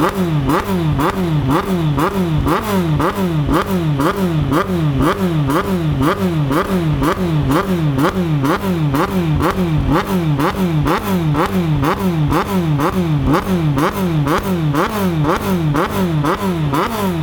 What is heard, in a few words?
Racing SE